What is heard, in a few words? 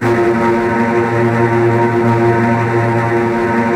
Virtuoso Strings, TRM FX